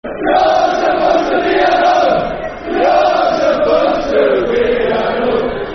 You're Supposed To Be At Home, Football Chants (Soccer Songs); Cardiff City; fanchants-com